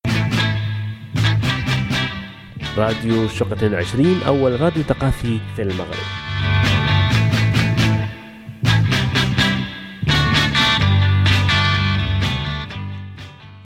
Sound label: Jingle